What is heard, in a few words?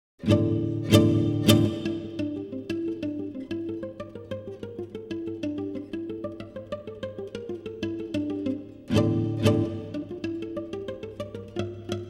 Guitar